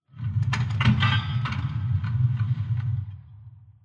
amb combine metal press

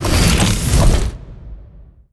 move backup, dog; npc; scripted

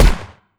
combine smg lfe; weapons; Half Life Alyx

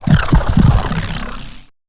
heartbeat, creatures

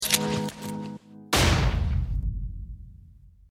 deathcam

